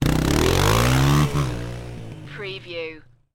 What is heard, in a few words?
Motorcycle Dirt Bike Drive Away